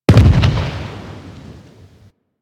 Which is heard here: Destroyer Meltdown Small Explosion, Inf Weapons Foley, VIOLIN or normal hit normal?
Destroyer Meltdown Small Explosion